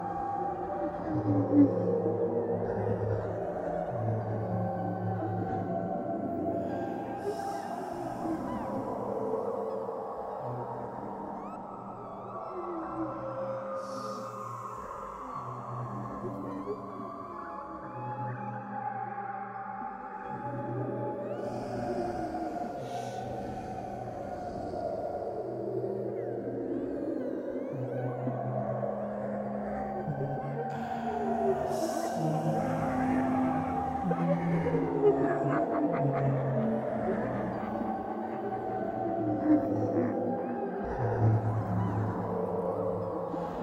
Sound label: Ghosts Atmosphere Turmoil; Pishacha; baileywiki maps premium towns